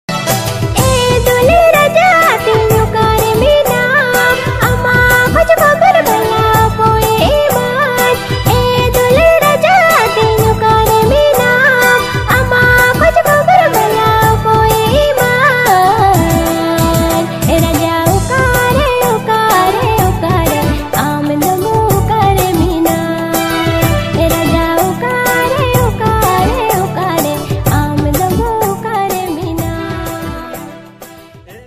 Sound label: Santali Ringtone